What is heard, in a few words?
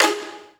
COL LEG F 4, STRINGED; East West; Global Sounds